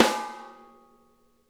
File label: SNARE RIM; Sonic Reality; Interactive Drum Kits